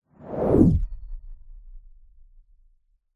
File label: cinematic fast whoosh lfe sub rumble; sound design